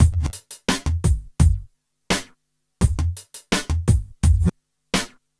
Smooth, R&B Drums

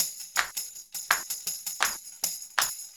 80 tam + cp; Percussion

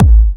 Sub Kicks, Bassdrums

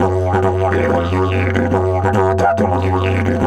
F 140, DIDGE RIFFS

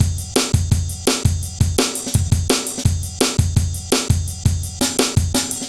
heavy live ride, drum loops; Zero-G Jungle Warfare 1, 2 & 3

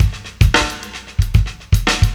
live beat; beats; Sonic Foundry (Sony Creative Software); Ugly Remnants VOL-2

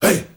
HEY HEY

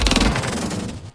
Sci-Fi, Classic SFX, cartoon; musicradar; cartoon caper

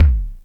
STREET BD; Crypton - DrumBase5000; DANCE3+TB303

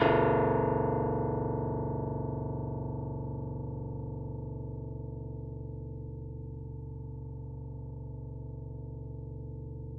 piano; East West; The Ultimate Piano Collection

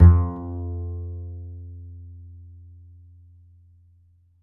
D, Upright Acoustic Bass; Larry Seyer; SWITCHABLE POSITIONS

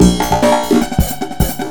COMPUTER, Big Beat Drumloops; USB Soundscan vol.25; AKAI

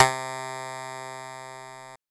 C2 clavinet

